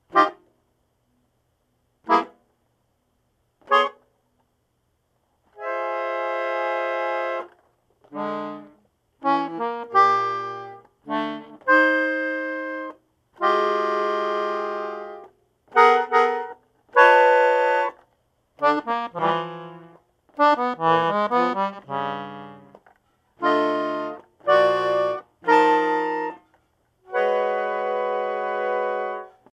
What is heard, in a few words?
improvisation